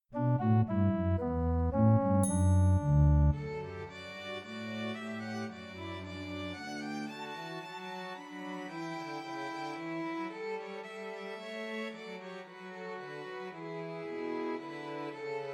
Christmas Trios for Two Violins and Cello; Away in a Manger

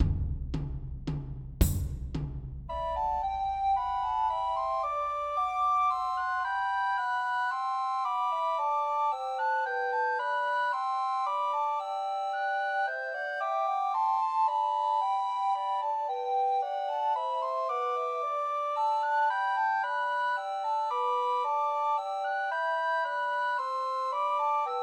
Trios for Two Descant and One Treble Recorder; The First Nowell; Christmas

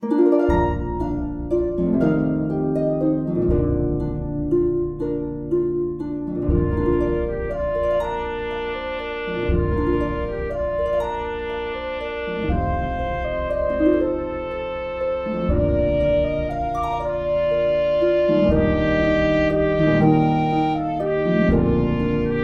Trumpet track with Melody; Silent Night

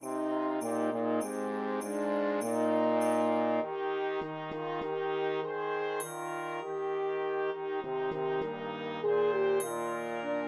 Trumpet Track with Melody; The Holly and the Ivy

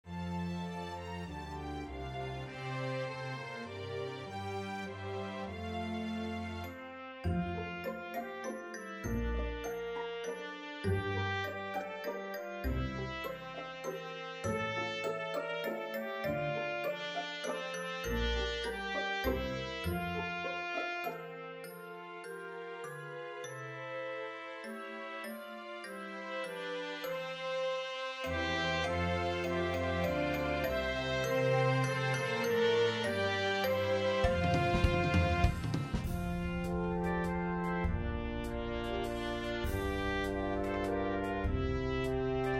Trumpet Track with Melody; We Wish You A Merry Christmas

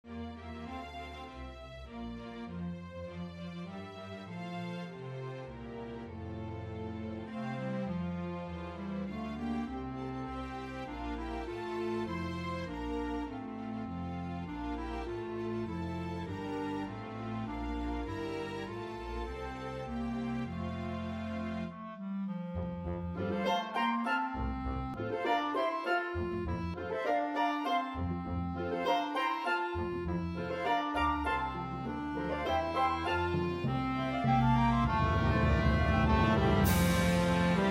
Clarinet Track with Melody; The First Nowell

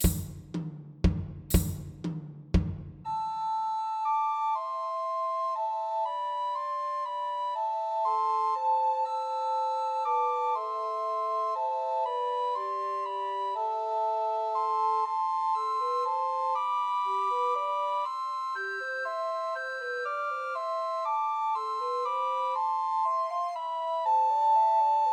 Trios for Two Descant and One Treble Recorder; We Three Kings; Christmas

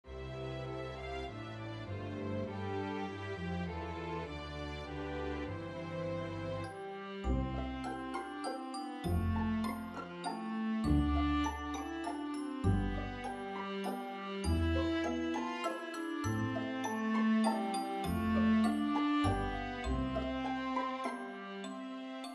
Viola Track with Melody; We Wish You A Merry Christmas

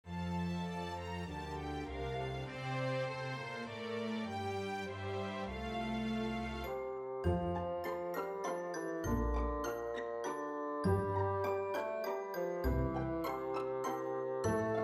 Tenoroon Track with Melody; We Wish You A Merry Christmas

